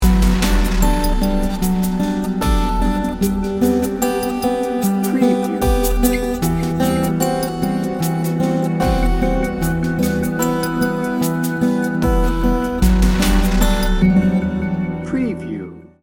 dreamy serene

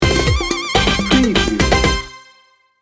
cha cha hip house sting bumper

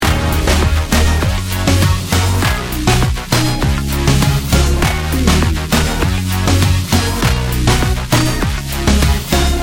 shake that loop

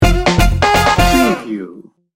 party player sting bumper

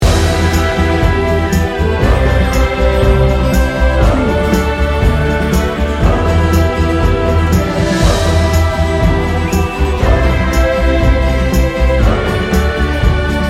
spaghetti western sting bumper

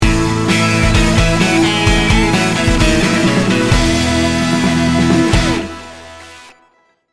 proud moments sting bumper

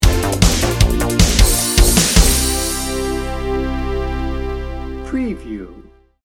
fitness fanatic sting bumper

